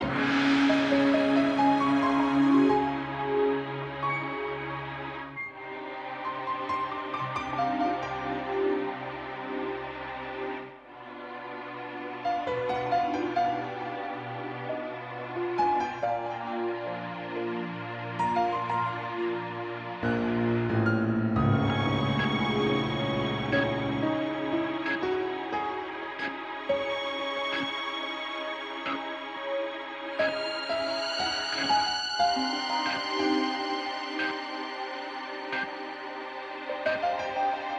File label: Melody; PixelJunk Shooter; PS3